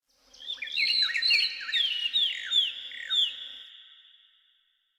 Bird Robin; Ambience; Warframe; Nineteen Ninety Nine

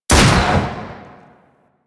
Scope Gun Shot; Crp Scope Gun

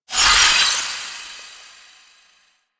Bleeding Shards Cast; Warframe; Citrine

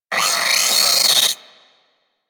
Sentient Brachiolyst Pain; Enemies; Barks

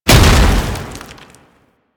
Tank Infested Strafe End, Movement; Warframe; Lasrian Tank

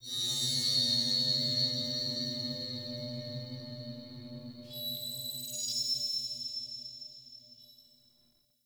Intro Sfx Pads; Warframe; Ehra Rok

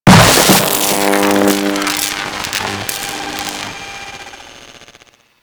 Flashbang Electric B; Grineer Death Squad

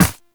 Run Ice, Footsteps; Warframe; Operator

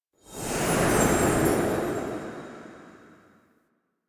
Directions Whoosh; Duviri; Bombastine

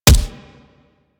Weapon Punch Shotgun

